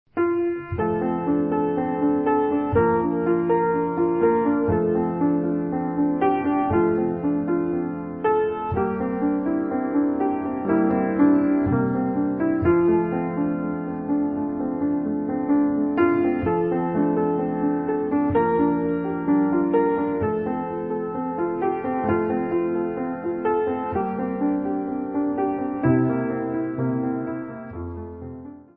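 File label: Hymn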